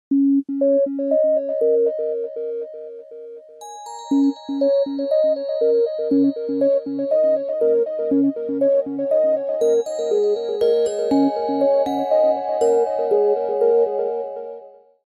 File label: Nokia C32 Original